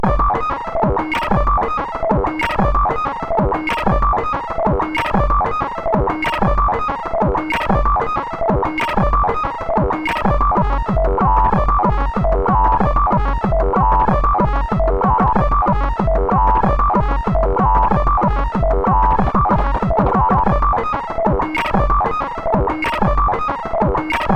Seconds of Hard Techno, sid CSG8580R5

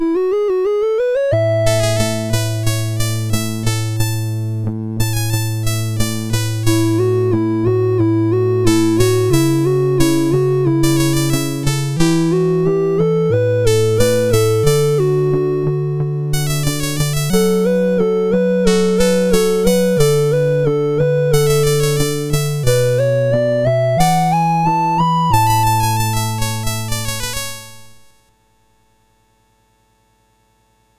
Synth Sample; MOS6581R4

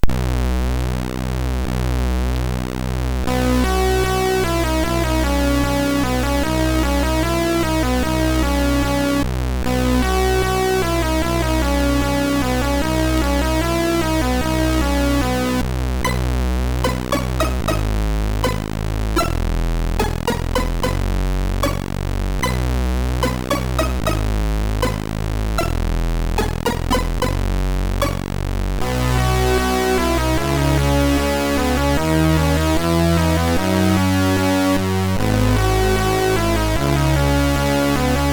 MOS 6581R3

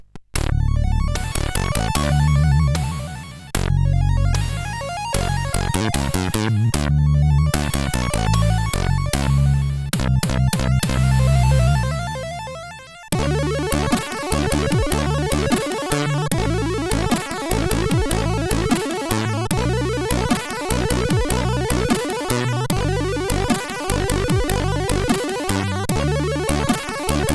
Slow Tune sid CSG8580R5

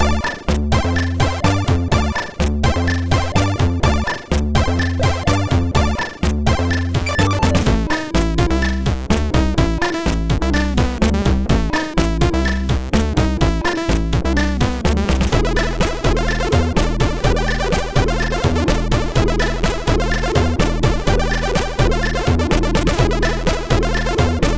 sid, MOS6581R4

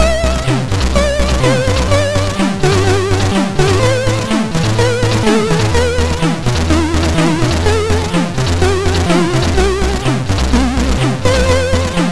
Russian Anthem, sid MOS6581R4

